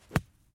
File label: golf club sandshot